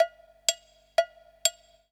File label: PERC LOOP ETHNO 125